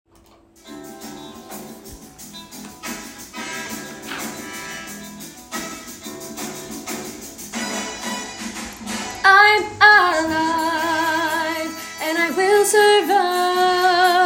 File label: Melody + Alto; Fame The Musical - Holiday Pack